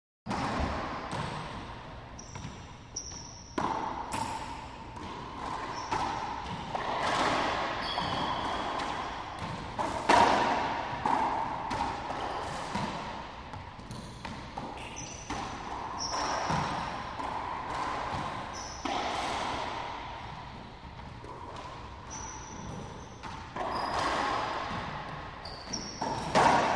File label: Racquetball; Racquet Ball Game Ambiance